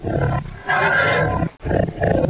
communicate; svencoop; sexr

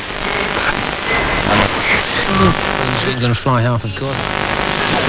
tune

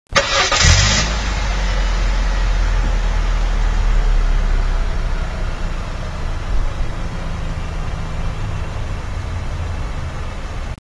cars; svencoop; saker

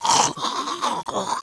cut, male

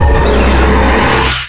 powerup; ricochet

